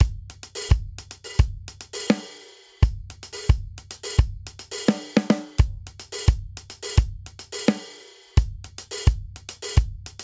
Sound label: Inbetween Hip Hop Buildup 86 bpm; MIDI Clips; 4 4; Ableton; Drum Booth